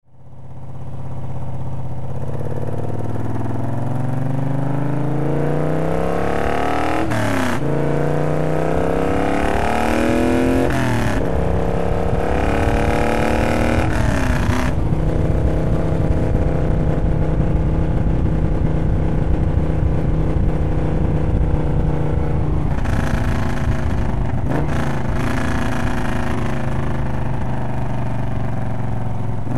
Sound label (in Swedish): Lang korning